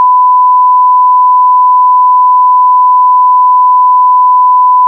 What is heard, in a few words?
1kHz